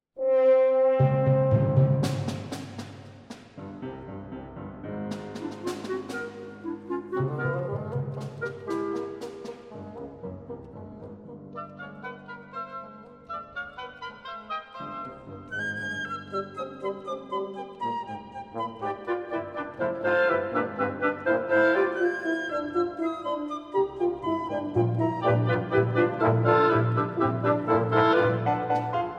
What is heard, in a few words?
Concertino For Violoncello